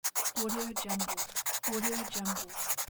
pencil

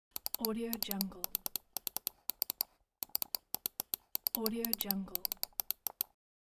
mouse clicks

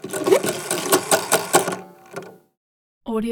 mechanism windup; soundmorph

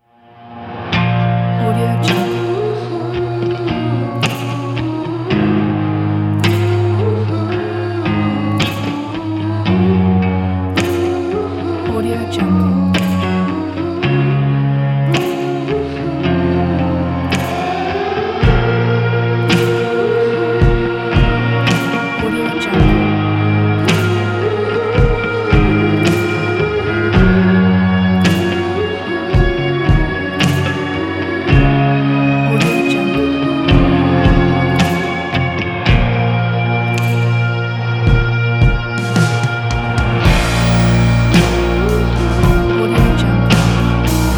cinematic indie; rainroot

